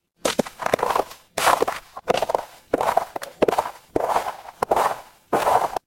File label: footsteps snow